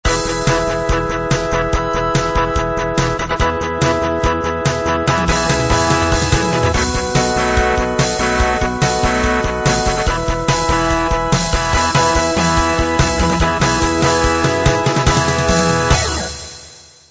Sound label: hard rock